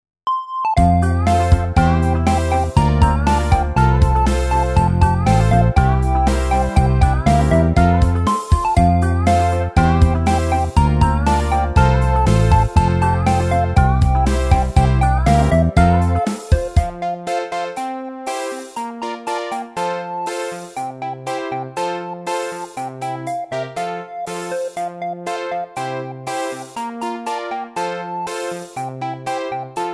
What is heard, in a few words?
Karaoke